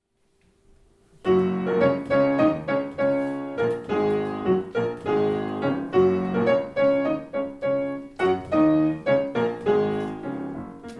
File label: the banjo